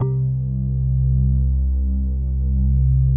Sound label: JUNO ORGAN, PADS; Masterbits; Vintage Voltage